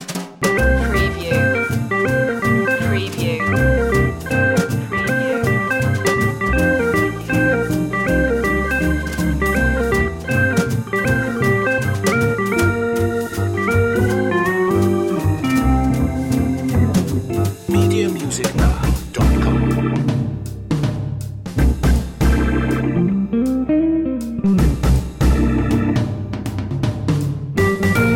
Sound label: kids blues